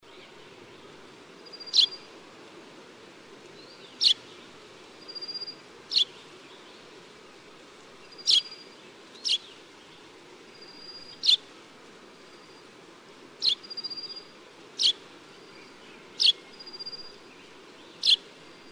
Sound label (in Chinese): 麻雀; 樹麻雀; 麻雀科/留鳥